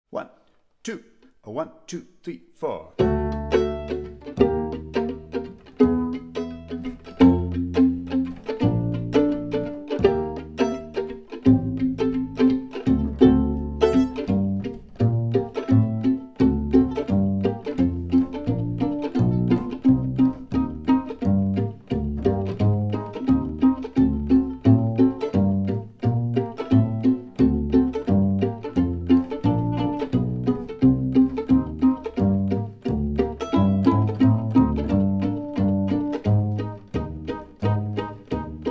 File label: backing track